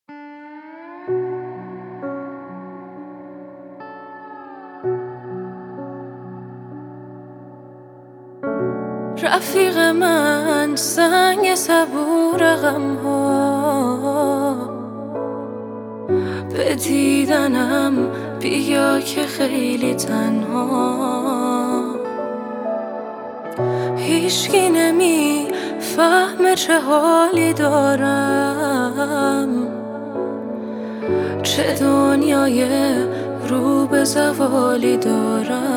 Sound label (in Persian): ba sedaye zan